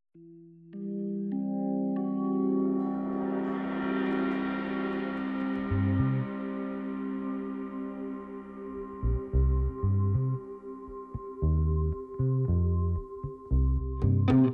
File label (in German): Atmosphaerisch fluffy